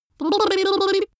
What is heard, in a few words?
lip smacking child; vocals; comedy-cartoon; soundpool